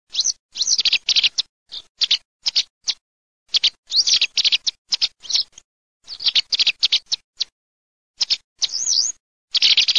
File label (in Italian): BALLERINA GIA GRIGIA